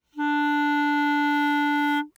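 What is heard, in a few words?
Eb Clarinet fortissimo D4; Woodwinds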